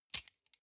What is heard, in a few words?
sunglasses plastic grab medium